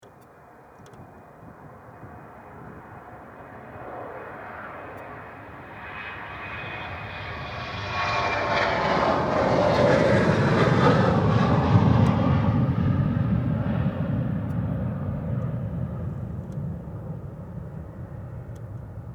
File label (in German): Flugzeug